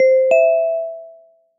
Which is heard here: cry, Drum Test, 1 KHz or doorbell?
doorbell